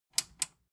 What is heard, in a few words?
metal button tiny click enable slow